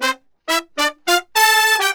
BRASS SALSA; World Grooves; Best Service ProSamples vol.14; AKAI

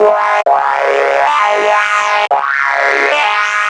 Synths; Underground UK House & Electro; Sonic Foundry (Sony Creative Software)

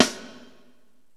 PICCOLO SNR; Crypton - DrumBase5000; BABY KITS

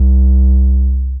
C Bass, DnB One Shots

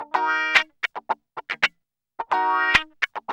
GUI, 110 BPM

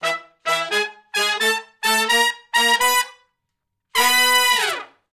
Sh Bls Climb Up to V (E) uni, Horncraft for R&B; Sections; Shf Blus Climb Up to V

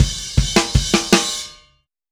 Happy Pub Beat Ending, Indie Rock; Sonic Foundry (Sony Creative Software); Indie Rock Anthems; Session 05 - Happy Pub

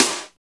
SNR, Tekno Industrial; East West

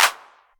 Clap, Novation Drumstation

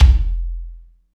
KICK, Tekno Industrial; East West

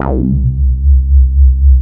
BASS, Analogon

